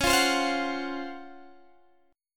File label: Db Major 11th, Guitar Standard; strummed; JGuitar